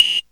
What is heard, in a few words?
whistle, percussion; Misc Percussion